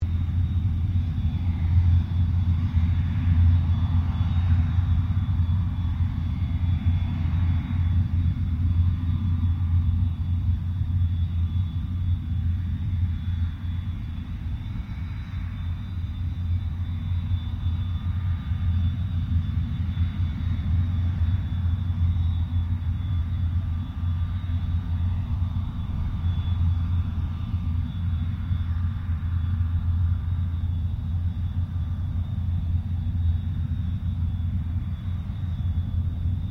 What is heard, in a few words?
AIRCRAFT CA-27 SABRE IDLE DISTANT; CA-27/F-86 Sabre; Airplanes; Vehicles